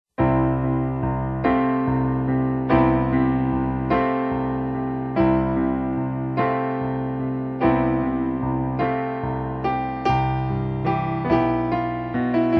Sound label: Piano